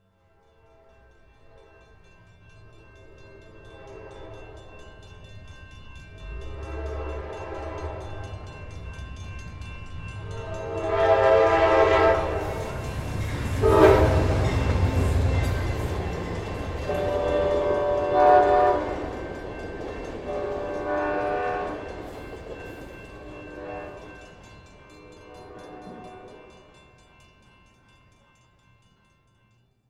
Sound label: Vehicle Train Pass By Short Crossing Bell; Trains; Vehicles